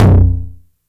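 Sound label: 909 low tom, tape saturation; Goldbaby Tape808 & Tape909; The Tape 909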